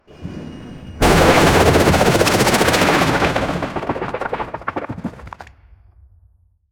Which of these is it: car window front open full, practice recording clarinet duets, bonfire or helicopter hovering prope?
helicopter hovering prope